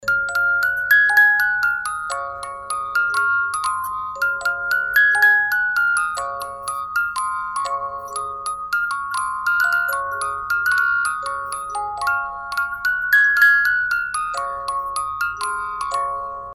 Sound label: Hymn European, musicbox